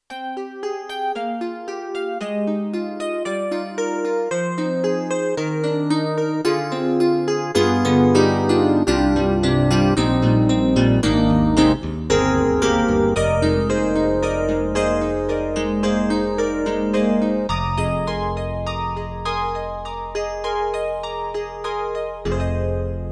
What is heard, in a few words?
YAMAHA MU1000EX, Gut EP